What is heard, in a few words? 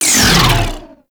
Ink Rifle End; Warframe; Tenno